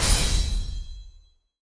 metal logo

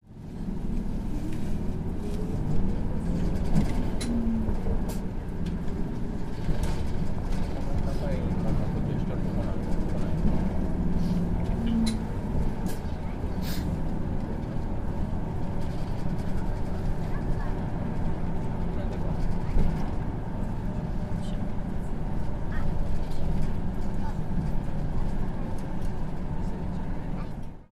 bus start, machine

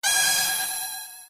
laser

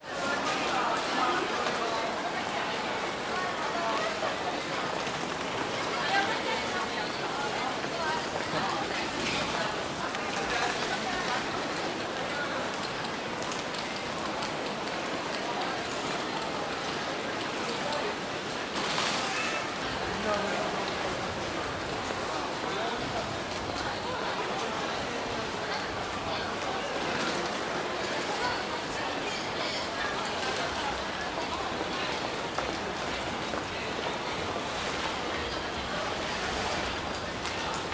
station yard holiday

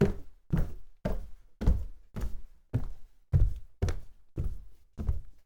walk staircase home descend

